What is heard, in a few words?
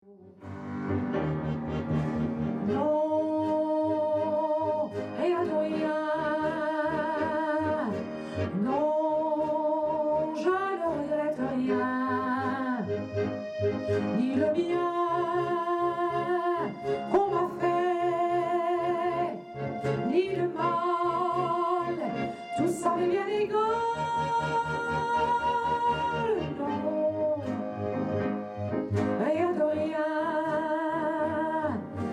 Tribute Singer